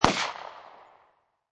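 Pistol Smith & Wesson P99 40 Cal Single Shot Exterior At 45'; Gun / Pistol: Smith & Wesson P99, 40 Caliber, Single shot, Exterior, at 45 feet distance; Lynne Music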